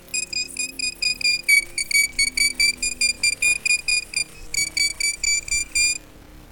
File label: Bats voice